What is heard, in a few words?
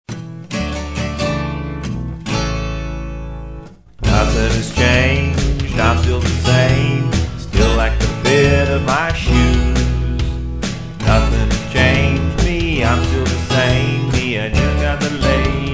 Blues